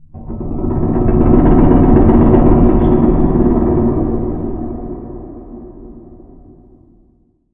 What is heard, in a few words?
clang amb